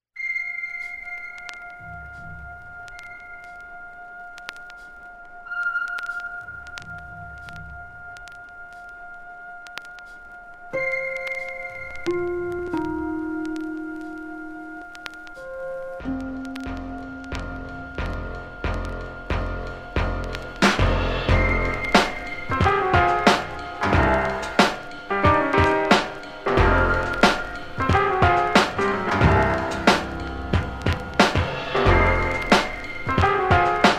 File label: Rain instrumental